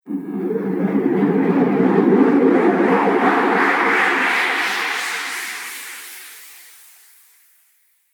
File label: Liquid Air Spell, magic sound effect; Fantasy Sound Effect; Andrew Fleming